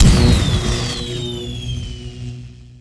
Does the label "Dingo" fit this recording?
No